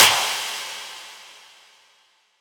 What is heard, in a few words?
Impact